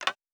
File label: Weapon Draw Wood; Fantasy Sounds Bundle; Medieval Combat Sounds